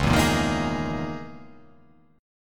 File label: C sharp Minor 6th Add 9th C sharp